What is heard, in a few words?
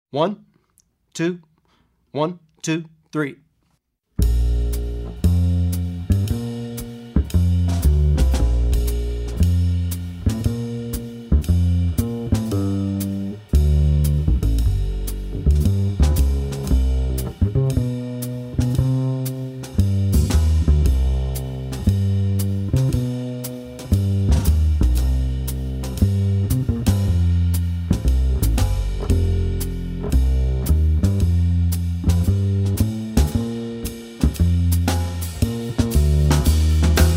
Jazz Piano without piano soloist; Effective Etudes For Jazz Piano